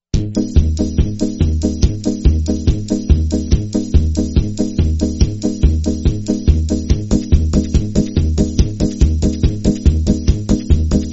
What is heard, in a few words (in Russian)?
veselaya melodiya; ochen dobroe utro